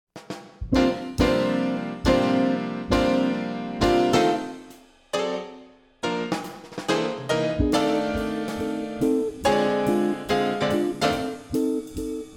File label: Jazz F Blues Improv Etude for Bass; for Bass Audition